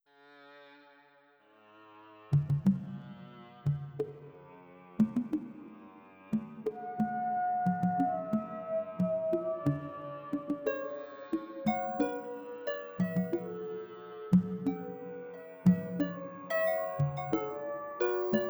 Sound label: CANON 432Hz